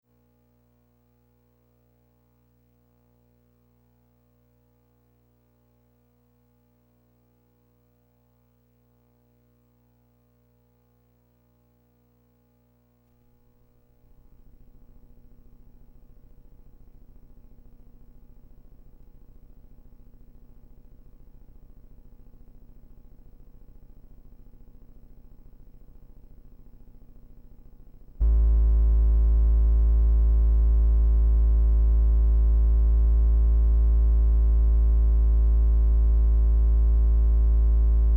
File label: birds and water